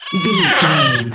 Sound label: goblin fairy death